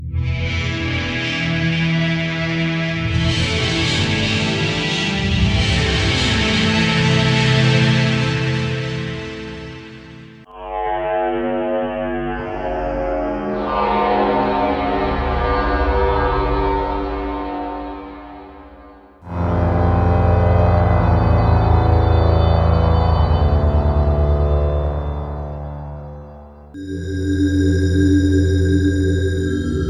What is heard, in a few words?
Athmo Dark; CinemorphX Presets SC